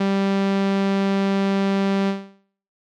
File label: saw filter